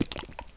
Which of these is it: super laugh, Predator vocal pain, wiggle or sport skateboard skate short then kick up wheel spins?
wiggle